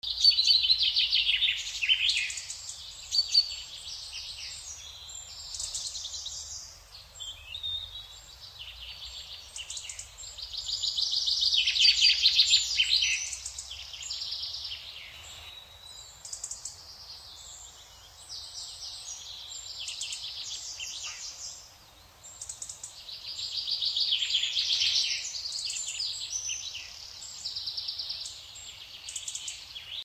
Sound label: forests british birds